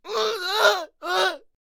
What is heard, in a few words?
Death Vocals; Berserker